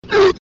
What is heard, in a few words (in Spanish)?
vomito rapido reverse